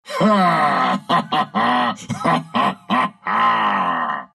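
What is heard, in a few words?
laugh, Russian; dota2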